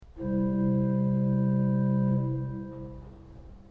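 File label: salicional 8 C, organ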